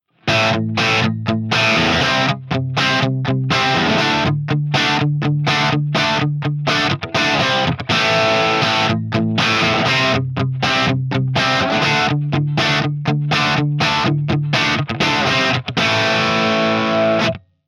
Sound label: Strato Bridge Pickup